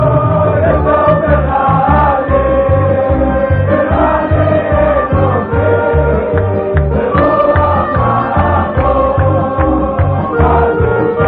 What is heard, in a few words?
Arabic oldest, ringtones